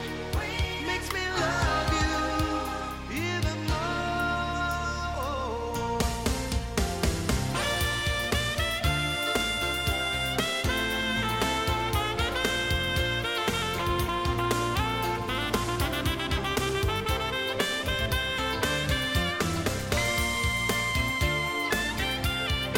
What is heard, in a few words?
sax